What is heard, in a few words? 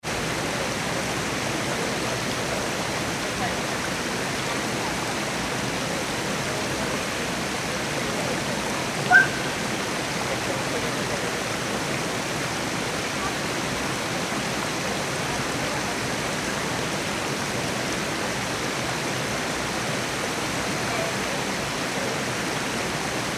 Pinares De Oromana Alcala De Guadaira Spain, Field Recordings; Pinares De Oromana, Alcala De Guadaira, Spain on 6th January 2025; Lovely quiet winter morning, next to one of the two water mills.; Cesar Gimeno Lavin